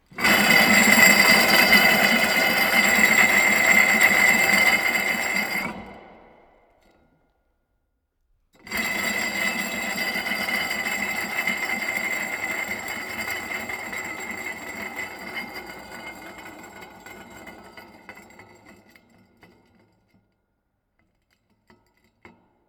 Metal Bearing Rolling Spinning Start To Stop Continuous Slow Down Scraping